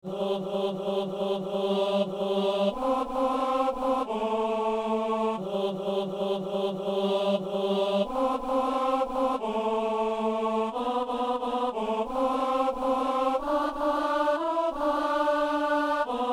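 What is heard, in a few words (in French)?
ténor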